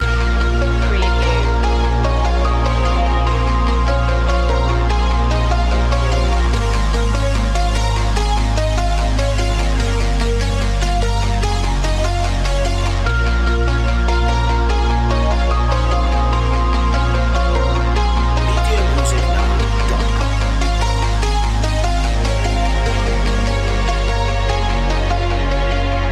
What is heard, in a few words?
biodigital future loop